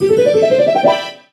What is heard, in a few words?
Jingle Training Success; Star Fox 64 3D